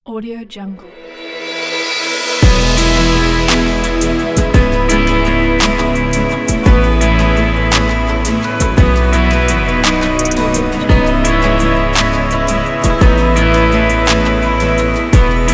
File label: motivational liberating corporate